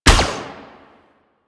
Minigun Shot

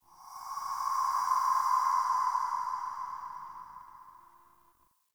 Spirit Gust; Ambience; Dungeons; Under City

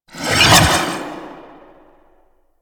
Ability Cast; Warframe; Harlequin

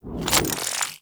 Spear Throw Charge C, Weapons; Warframe; Corpus; Spear Gun

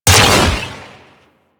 Explosive Impact; Weapons; Warframe; Sapient; Sapient Primary

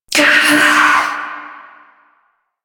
Struggle Short; New War

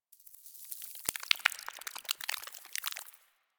Ext Spine Move Close; Ambience; Misc Outdoor; Warframe; Infested Eris

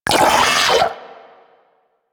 Arachnoid Vocal Pain; Enemies; Vocals; Warframe; Corpus; Arachnoid Hunger

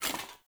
Foley Action Armour Walk; Player; MotherArmour

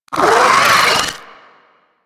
Amalgam Moa Satyr Vocal Attack; Warframe

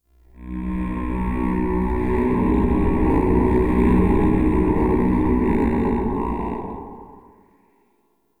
Exterior Eerie; Ambience; Duviri; Warframe

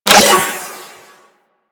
Melee Pet Projectile Attack, weapons; DE; Warframe; Sentinel; Crp Melee Pet

